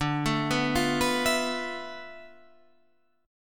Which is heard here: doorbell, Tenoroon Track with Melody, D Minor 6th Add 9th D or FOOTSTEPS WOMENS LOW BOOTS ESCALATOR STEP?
D Minor 6th Add 9th D